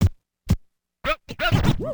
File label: scratch, DJ Booth